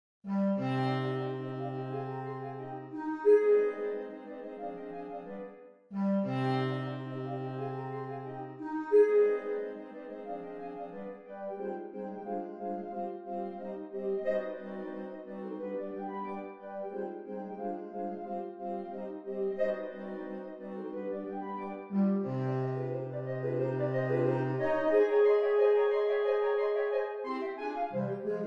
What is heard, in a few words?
Clarinet; Mendelssohn; Mid Summer; Elf March